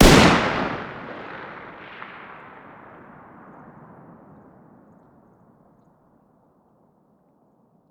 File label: fire dist 308 mg ext